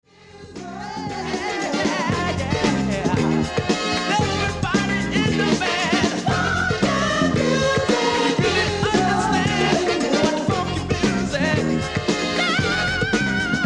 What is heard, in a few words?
Funky Music